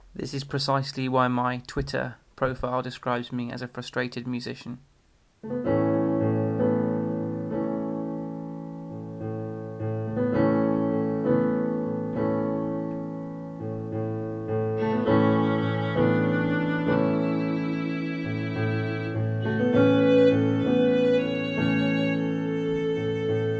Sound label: short music